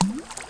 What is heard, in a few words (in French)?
plouf; Emme Arc En Ciel Et Le Lagon Merveilleux